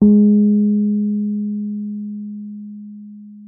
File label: WURLITZER G#3; Xsample VOL-1; WURLITZER B